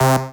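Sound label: Rave Synth, Dance Mega Jungle Rave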